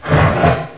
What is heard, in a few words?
ag attack; agrunt; video games; source engine; bshift